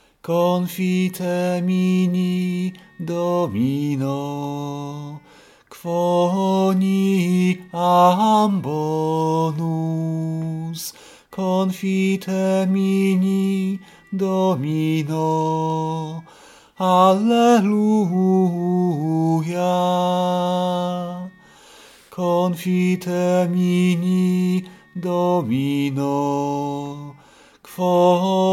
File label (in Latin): Confitemini Domino sopran; Taize